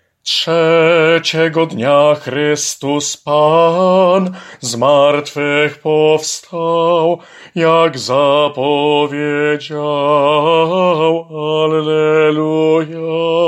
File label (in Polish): Piesn o zmartwychwstaniu ALT